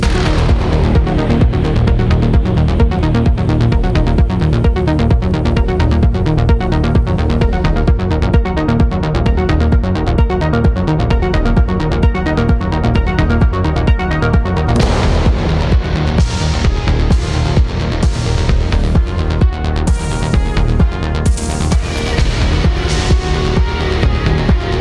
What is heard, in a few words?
Music Battle Scifi; Rabbids Multiverse